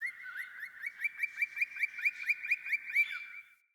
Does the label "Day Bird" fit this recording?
Yes